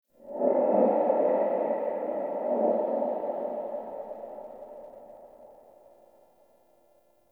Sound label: Texture Interior Small One; Ambience; Warframe; Sentient Lair